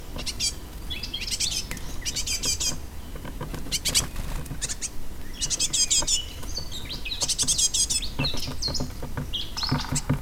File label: verso juv cinciallegra parus major great tit; carbonero; bioacustica